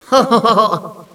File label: ho hohoho, Echo inside caves, underground; DS; ML BOWSERS INSIDE; Luigi